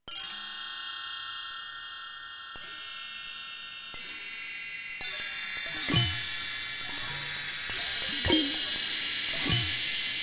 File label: Bell Tree